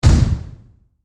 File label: Horror Magic Poof Flash Powder Impact